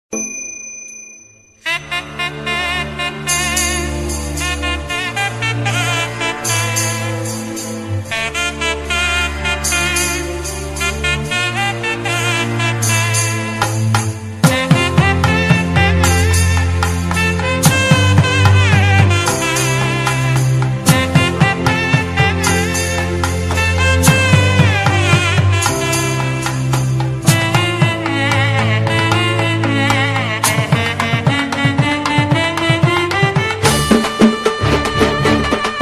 love ringtone, Tamil love ringtone; anegan

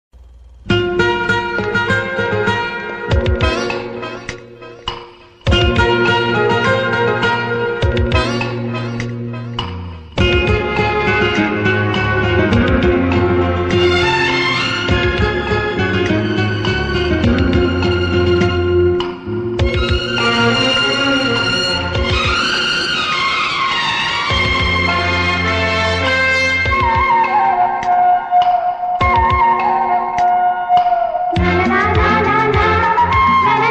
kannada bgm ringtone

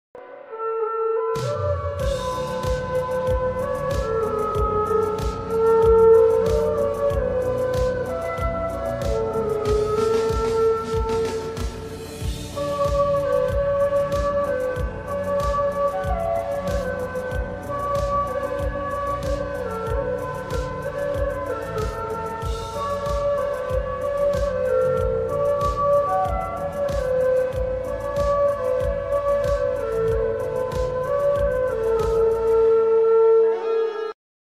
flute ringtone best viral ringtone

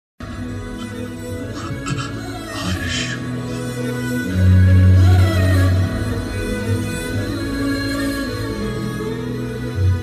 death emotional bgm climax death bgm ringtone; amaran

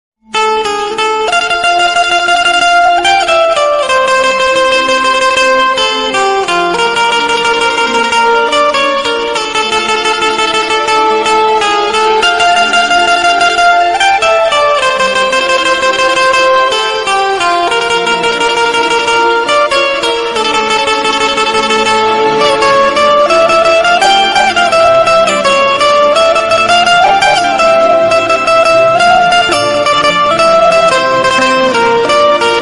army ringtone desh bhakti ringtone; karma music; happy republic

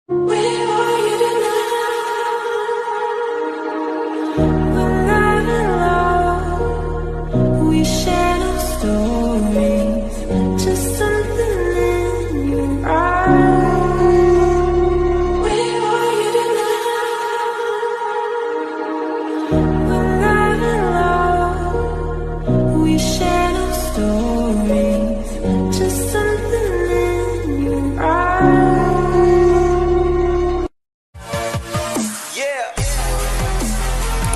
music ringtone slowed reverb

